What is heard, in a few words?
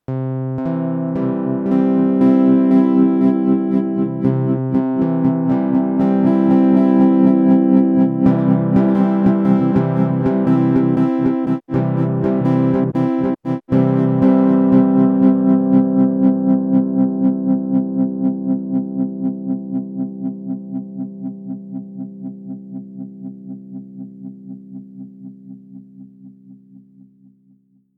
var delay